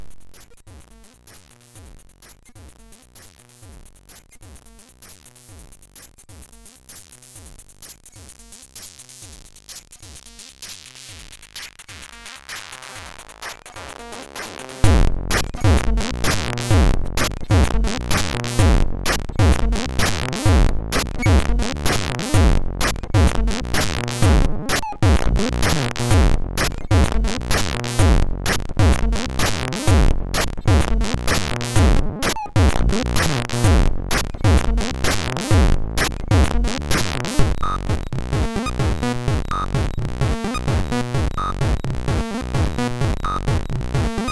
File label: Loop, MOS6581R2; MiniSEQ Loop Song